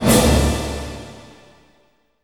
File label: levelup fx